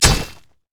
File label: basicattack; pantheon